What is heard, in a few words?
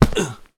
Punch Grunt